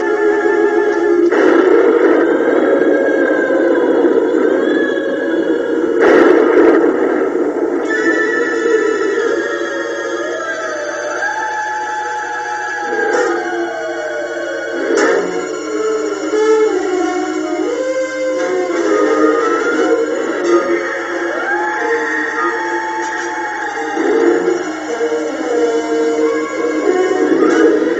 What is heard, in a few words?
Banshee Wail